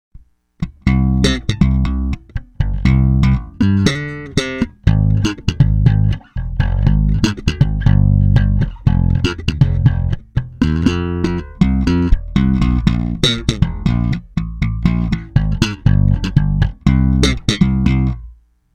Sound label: AFTER 120 SLAP ACTIVE, ベース, Bartoliniプリアンプ; Bartoliniアクティブ・スラップ（EQセンター）; TAURAMベース; バルトリーニの方はTAURAMに比べると、単純に歪んでハイローが持ち上がっている雰囲気。ドンシャリど真ん中。